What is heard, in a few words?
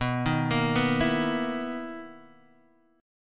B Major 11th B, guitar; BM11 Chord {X 2 1 3 0 0} arpeggiated